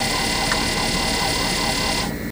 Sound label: Motor Computer Prin, printers; Motor For Computer Printer